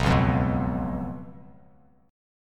B Suspended 2nd Flat 5th, Bass Standard chord; strummed; JGuitar

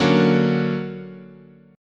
D Augmented Major 7th, guitar chord

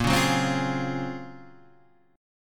Gb Major Sharp 11th Bb, guitar

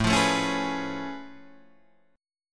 A Augmented Major 9th A down Guitar Open D

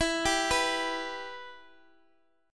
E Minor E down Guitar Open G

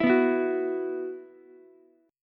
B Suspended 4th, Ukulele; 9,11,12,9; strummed; JGuitar

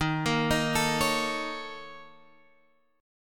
Bb Minor Major 7th Double Flat 5th

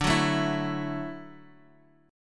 D-Major Add 9th, D, 4G, 5C, 5E, 5A

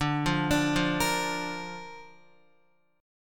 D Minor Sharp 5th, D, down, Guitar; Dm#5 arpeggiated

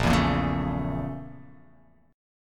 A sharp Minor Major 7th Flat 5th A sharp; A#mM7b5 strummed; JGuitar

